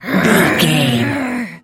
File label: voice female growl groan